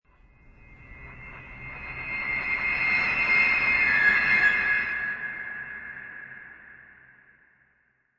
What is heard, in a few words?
Creepy Piano; Piano notes being trilled rapidly with added processing effects